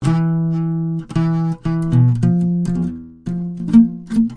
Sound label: plain suspense guitar; GUITAR LOOPS